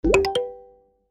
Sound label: Pop Notification; konnektedstudios